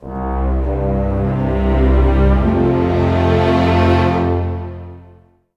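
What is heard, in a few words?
108, orchestra; East West; Scoring Tools; Lonesome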